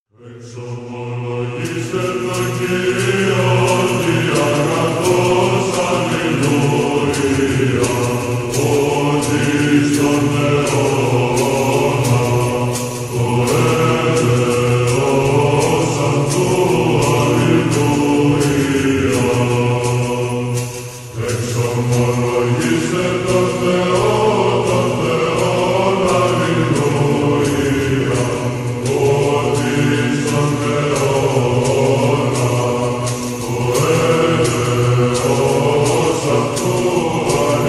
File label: psalm military orthodox byzantine music; psalm 135